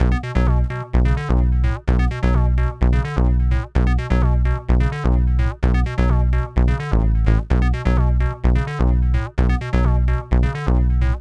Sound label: Electronic